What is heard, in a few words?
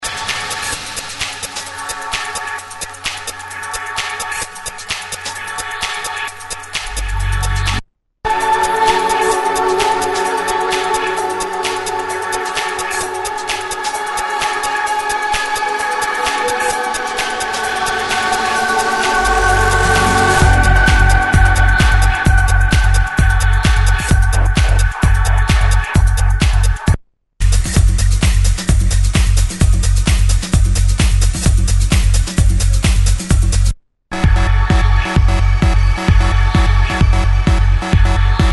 Rock Music